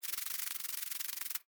Insect Wings Move, Animal, Insect, Wings, Movement, Shake, Bug